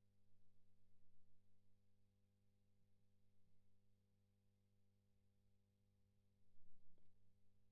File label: Strum